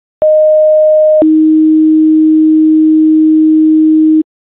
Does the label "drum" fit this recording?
No